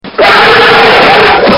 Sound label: Werewolf Attack; Halloween